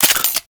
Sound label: Pistol Mech; Warframe; Grineer; Orokin